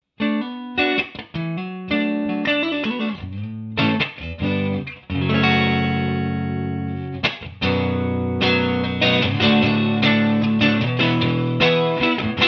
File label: clean